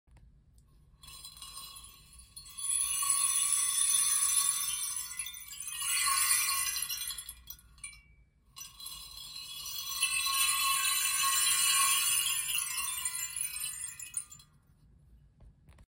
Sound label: rainstick